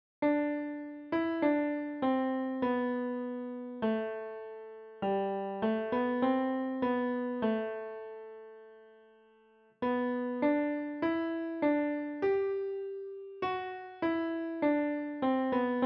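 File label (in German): Klavier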